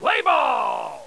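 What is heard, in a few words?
ball acquired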